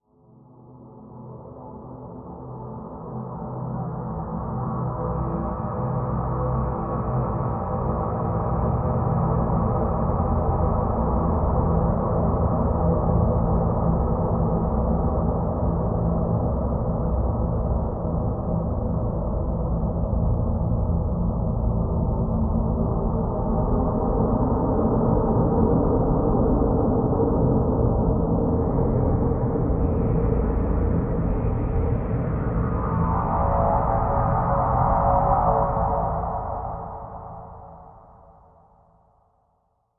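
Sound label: Drn Dimensional Simple A, drone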